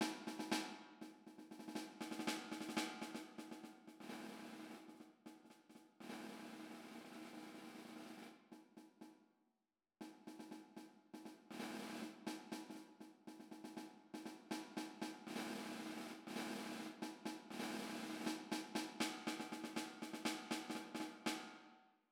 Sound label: Snare